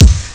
bass drum; Amiga Soundtracker Sample Packs

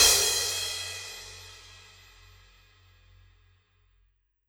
Cymbal, Orchestral Percussion One Shots; Sonic Foundry (Sony Creative Software); Orchestral VOL-2

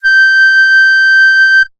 lead whistler F; leads

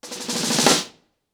Snare FX 90BPM, ACOUSTIC DRUMS; Sonokinetic - The Roots Drumloops

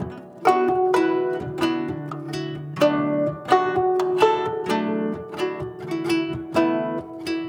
Guzheng Poly-Rhythm; Asia; Continental Drift Aftershocks

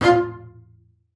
F4, BASS SPICC; Xsample VOL-5

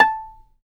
fiddle pizz A5 R; Sampleheads; Whole Lotta Country 1 & 2

